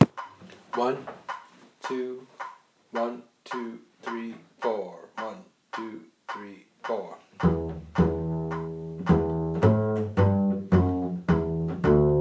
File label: cakewalk bass